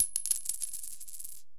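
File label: Coins 3 Fall On Concrete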